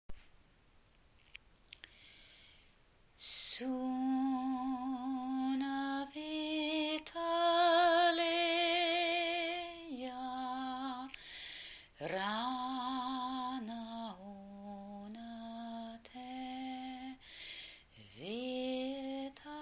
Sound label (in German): heilgesang sitzung